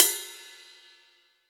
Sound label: drum ride f key, Royality free ride tuned to the F note. Loudest frequency: 8057Hz